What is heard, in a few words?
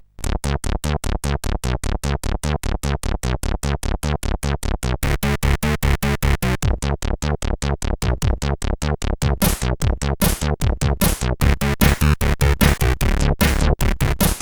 Music, CSG8580R5; sid; Truck MO Music